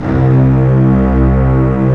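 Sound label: C2 MEGA STRINGS; ORCH.COMP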